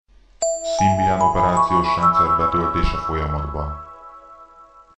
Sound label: Boot symbian